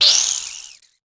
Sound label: human zit spot squeeze splat